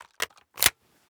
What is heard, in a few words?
lr300 insert mag; Rust